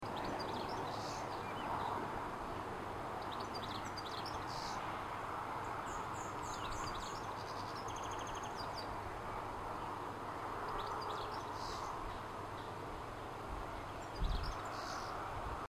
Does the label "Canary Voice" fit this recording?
Yes